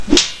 warrior attack; megaglest

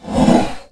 battlemage attack; megaglest

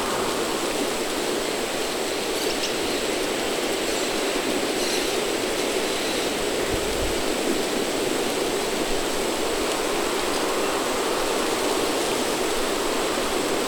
nature loop Boucle; godot third person basic scene

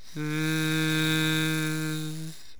bee; megaglest

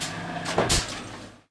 machine attack; megaglest

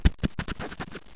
knife; megaglest

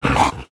gutteral beast; RPG Sound Pack; NPC; artisticdude

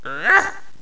carpet attack; megaglest

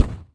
airship attack; megaglest

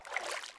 water; megaglest

